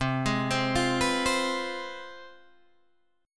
C Major 7th Suspended 4th Sharp 5th, C, down, Guitar, Open G